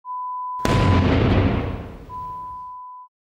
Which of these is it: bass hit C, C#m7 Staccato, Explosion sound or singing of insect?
Explosion sound